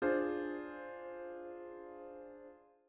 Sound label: D half diminished